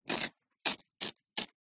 sharpening new pencil with small plastic sharpener